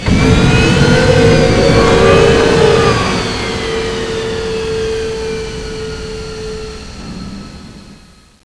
dropship fly wings

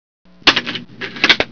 reload, weapons; halo

